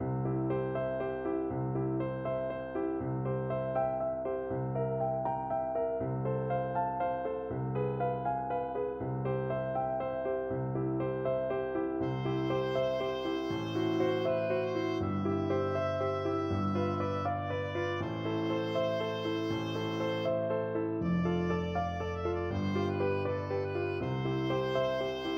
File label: schubert ave maria, oboe